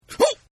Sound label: Grunt; Partners In Rhyme